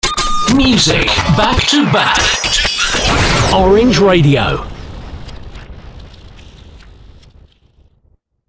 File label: Music Back To Back Mixed